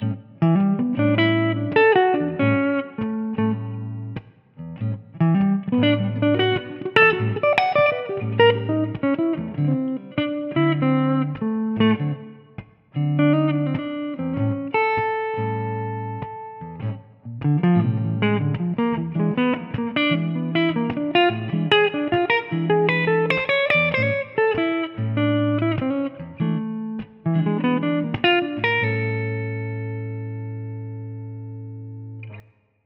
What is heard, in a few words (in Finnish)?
blues